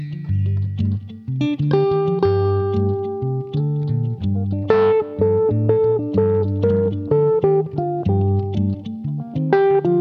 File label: the african loop